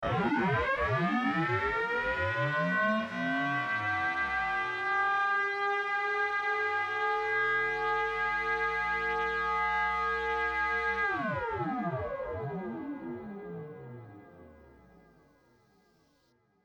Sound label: ring modulation, MS20